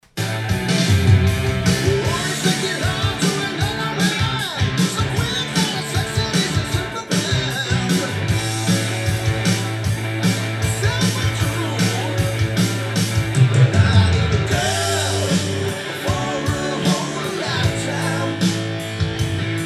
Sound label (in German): 180 Grad Musik Anlage ohne Windschutz; Centrance MixerFace R4B